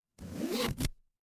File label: paper fabric zipper